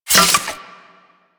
Reload; Kuva Zarr; Cannon

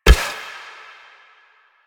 Choir Bow Quick Fire; Warframe; Weapons

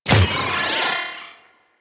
Drone Death Malfunction; Warframe; Venus; Venus Terra Attack Drone

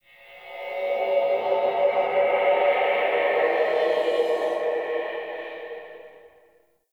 Cymbal Swell; Ambience; One Offs; Champion Crypt

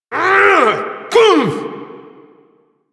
Parry Finisher Vocals; Enemies; Finishers; Drifter; Warframe; Duviri; Dax

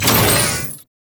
Shared Fate Chain Start; Warframe; Warframes; Pax Duviricus

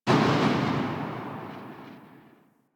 Assault Rifle Tail; Weapons; Warframe; Grineer

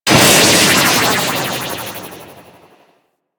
Stealth Reverse; Warframe; Vehicles; Archwing Stealth

